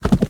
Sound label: ox step; smite; god gilgamesh